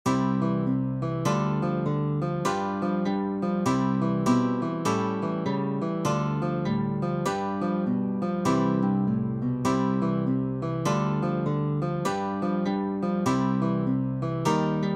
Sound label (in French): La fanfare du Sol; Je deviens guitariste – Volume 3